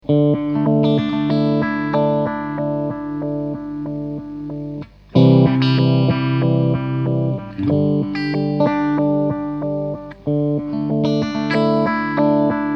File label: stingray multi filter; source audio soundblox 2